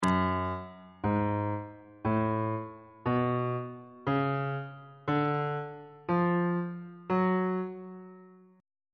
g flat harmonic minor scale note positions on bass clef